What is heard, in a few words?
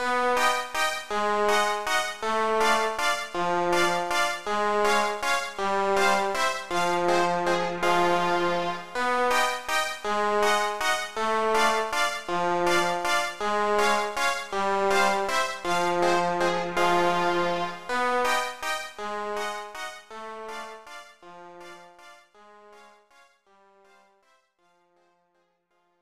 Stately Atmosphere; clue 1992 snes